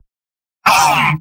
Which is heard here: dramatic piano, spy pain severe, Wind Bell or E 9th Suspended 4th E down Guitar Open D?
spy pain severe